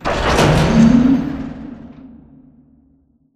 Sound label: Robot Arm Track Start Mid; Boomtown; Titanfall 2